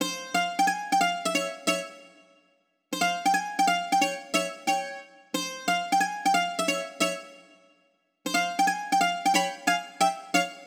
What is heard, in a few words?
Bouzouki, 090 Cmin; Hip Hop; Hip Hop Exotica; Apple Loops; Big Fish Audio